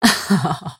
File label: mirana laugh; dota